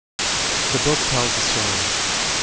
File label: conv noise